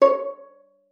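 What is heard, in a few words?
violin pizzicato C#5; Xsample VOL-5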